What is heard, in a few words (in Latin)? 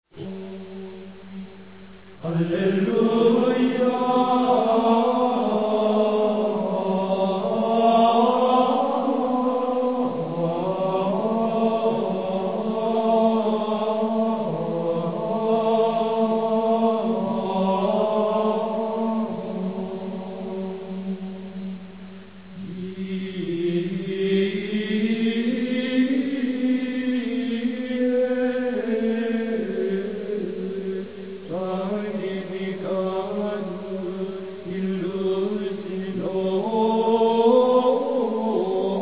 Aleluya Dies santificatus